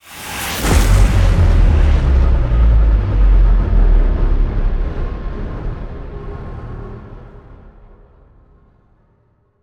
Stinger Modulate

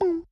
Slime Vox Small

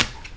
Dog Step; Birthday

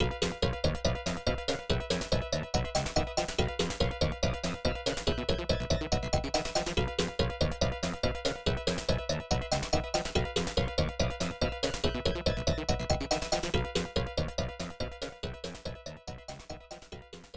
Continue; Bomber Through; GoGo Jump Hero Gaiden 2; IBM PC/AT

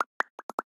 Slime Aggro

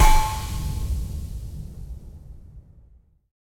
Mech Impact Rumble; Styx

